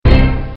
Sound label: special stop